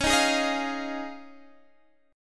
F Minor 7th Sharp 5th C sharp

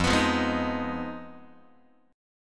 F Minor 7th Flat 5th, Guitar; strummed; JGuitar

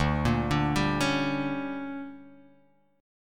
D Major 7th Suspended 4th Sharp 5th, chord

